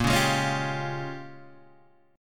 A sharp Minor 13th A sharp, Guitar chord; A#m13 Chord {6 4 6 6 6 3} strummed

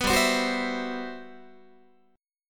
G Major 13th, Guitar; Listen to GM13 strummed; GM13 Chord

